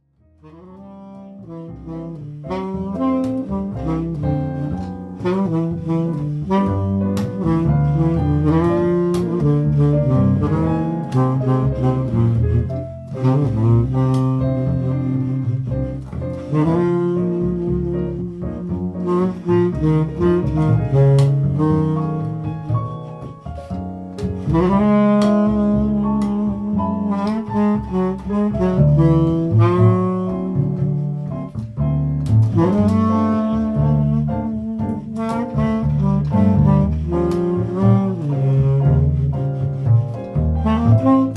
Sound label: Sax, Piano, Bass